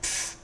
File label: spit